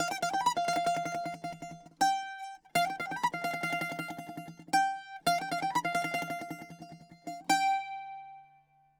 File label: bouzouki